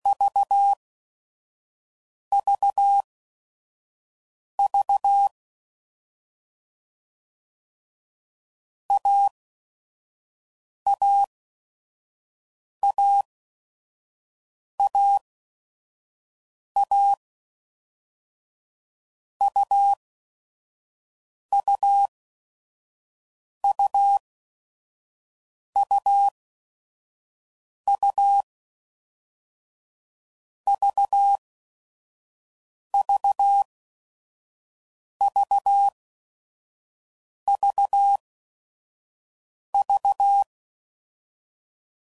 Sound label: AUV AUV, CW Code Groups 5WPM; Morse Code; Short Runs; HamRadio; Mixed